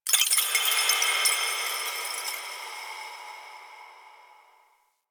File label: Moon Chimes Small B, Ambience; Warframe; Orokin